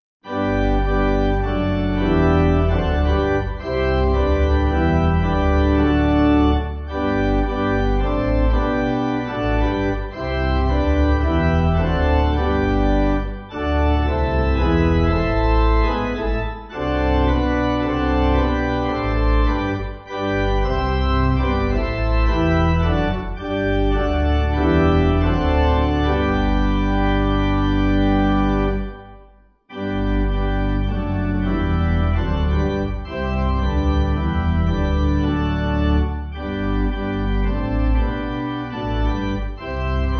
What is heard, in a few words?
Pipe, Pipe Organ, Music Backing Files for Churches; St Dunstans